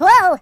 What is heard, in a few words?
Jump, voices; hedgewars